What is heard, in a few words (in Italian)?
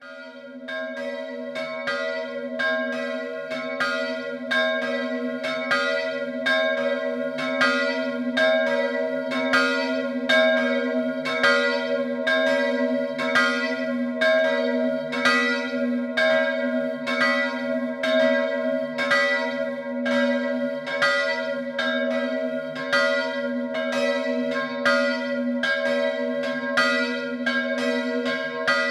campane; Dignano Pieve